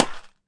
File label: Plastic Pot Filled, Found Percussion Hits; UNUSUAL KITS and FX; ModeAudio